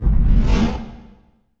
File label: IMP FLAME, Cinematic Impact; M-Audio Pro Sessions; Liquid Cinema